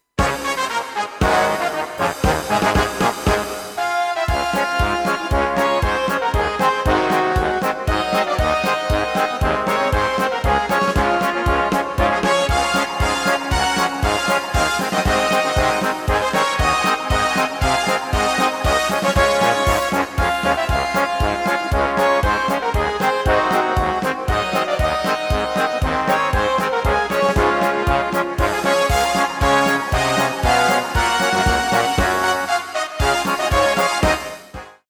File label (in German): Blasorchester